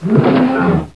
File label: LION DIE; Caesar III